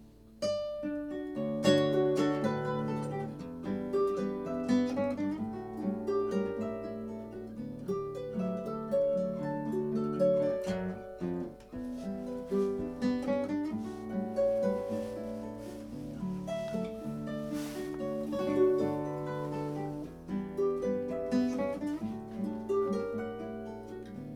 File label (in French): Duo guitare et harpe